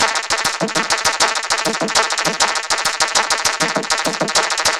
Vocobeat; Sonic Foundry (Sony Creative Software)

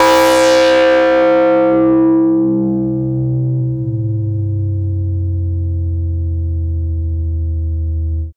single-synth, techno, c; Techno ID

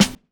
KIT, Dance Mega Drums, HIP; Best Service

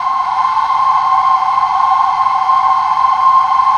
CRIK CHOIR; Spectrasonic Distorted Reality 1; CRICKETCHOIR

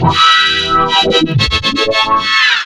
hit brass; BRASS KIT; AKAI

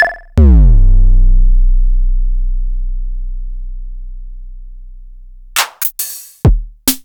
Korg Electribe ER-1 Kit; Sliced

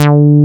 SPIK D4, SPIKE BS

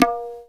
CONG TOM, CONGA BONGO; Techno 138 BPM; Best Service ProSamples vol.54; AKAI

